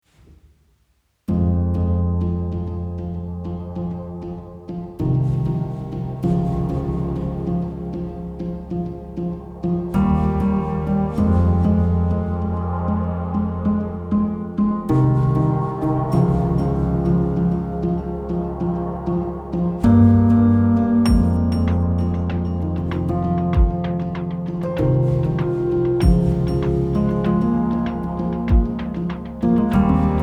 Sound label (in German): Gedanken 97 BPM, mellow beats